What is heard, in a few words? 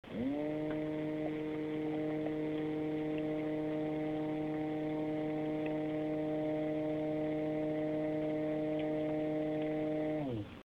aquagate mini pump